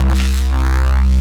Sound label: C Bass, DnB One Shots